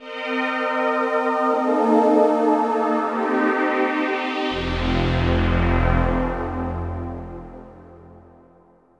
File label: synth pad ambient 160 C; Sphere